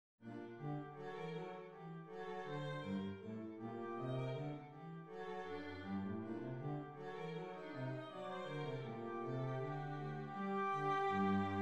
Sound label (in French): rigaudon